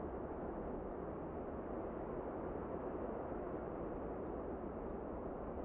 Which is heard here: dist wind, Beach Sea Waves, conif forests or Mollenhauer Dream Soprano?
dist wind